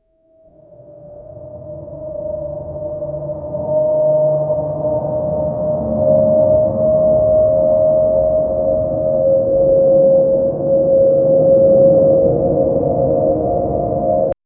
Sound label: ambient soundscape set in, post apocalyptic